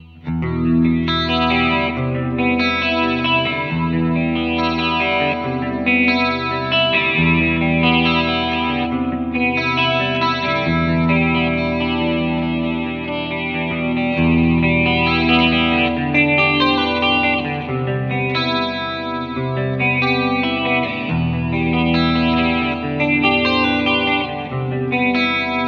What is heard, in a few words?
Intro Clean line